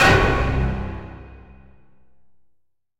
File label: Monster Stinger, soundtrack; transistor